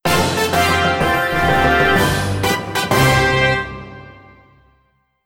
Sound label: Clear Dungeon; dragonfangz 3ds ps4 switch xbox gamerip 2017